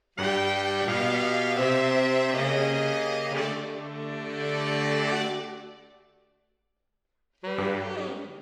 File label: MKH AB, piano